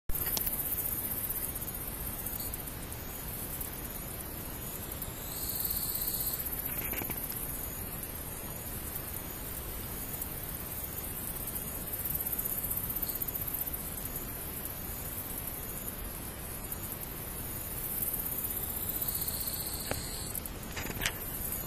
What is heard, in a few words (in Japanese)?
mushinokoe